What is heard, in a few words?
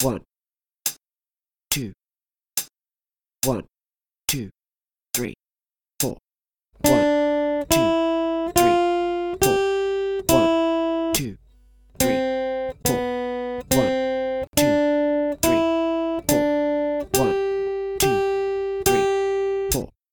3 strings, guitar